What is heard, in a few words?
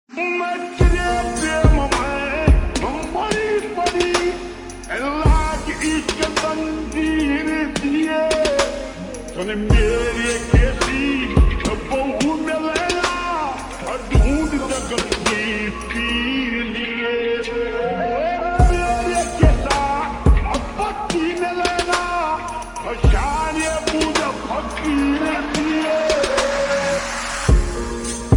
Slowed Reverb Ringtone